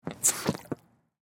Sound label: Water, Garden Hose, Faucet Squirt, Gurgle; Yard Tools; Big Room Sound